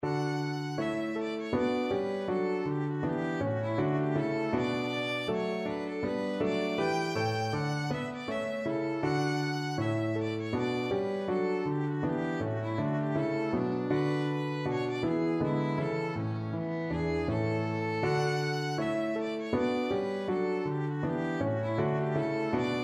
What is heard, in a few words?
hornpipe violin